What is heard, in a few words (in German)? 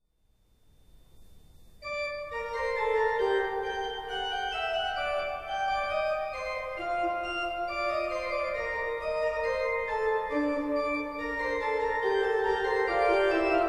Fuge g moll; BWV 542